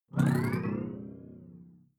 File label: sci-fi alien big bionics up; swooshes-swishes